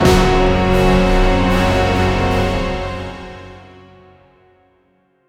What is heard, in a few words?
a powerful orchestral hit